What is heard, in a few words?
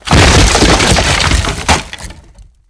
Physics Smash Cave Wood rock collapse; Counter-Strike: Global Offensive